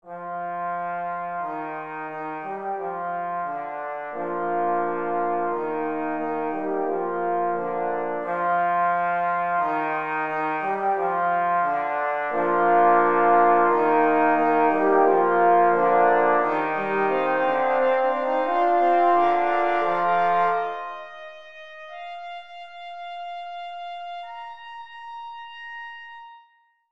Trumpets

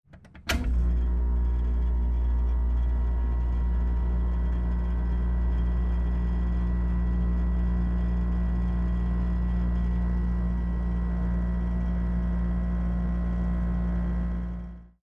air conditioner

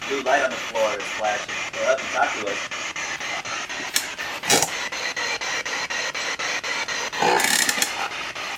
c-141 unusual noise

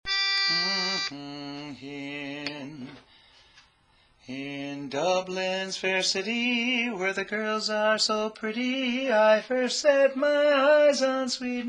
Lead; Molly Malone

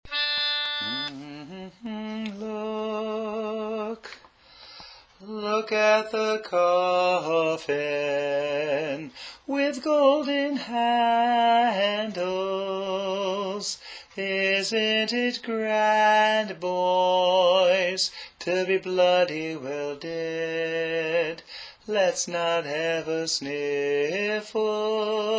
Bass

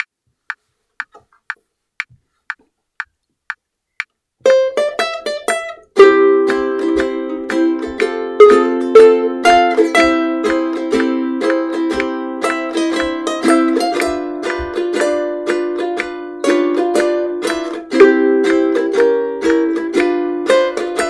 Strum Chords

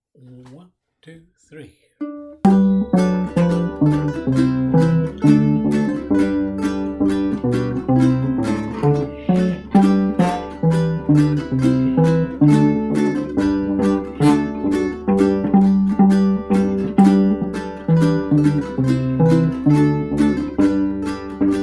Bass; Bransle Des Chevaux

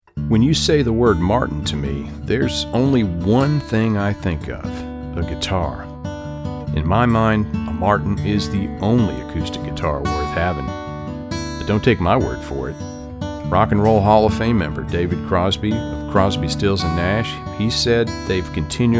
Martin Guitars

